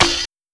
Snare

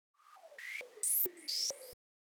Beat Starter FX

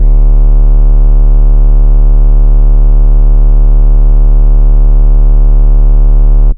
BASSLINE, 808s

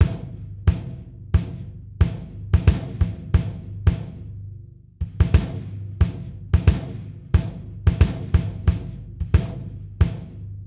Jazz 90bpm Kick

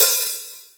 hihat open

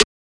Sn, Snares

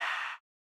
Chants, Vox

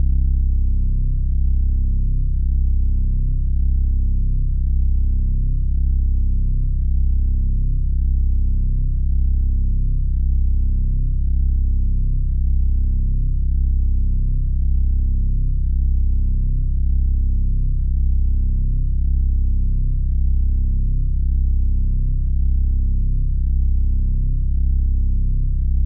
Bass, 808s; 90210

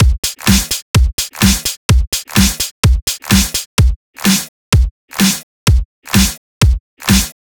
loop

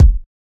Kicks

